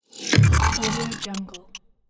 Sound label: glitch impact